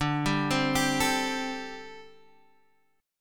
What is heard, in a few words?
D 7th Suspended 4th, down, Guitar, Half Step Down